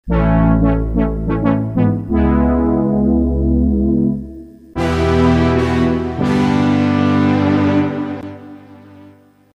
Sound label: moog